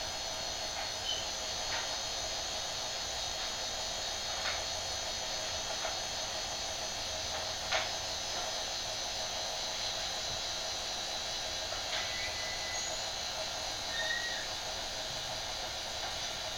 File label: door squeak three times; heard three beeps; hears door squeaking. I hear both on this recording. (found at 12 seconds on clip); Springfield Carriage Co; Edwards